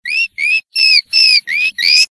friendly cirping

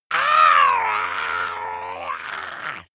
monster scream high

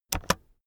keyboard external space press fast

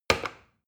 plastic button start click heavy fast

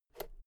fader single slide click

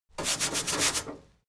mechanic sink brush

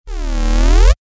sweep short bassy

